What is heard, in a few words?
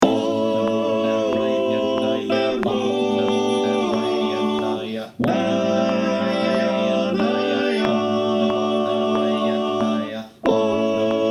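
soprano alto tenor bass, choir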